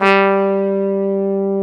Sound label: trumpet G2